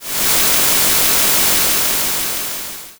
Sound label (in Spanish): s ruido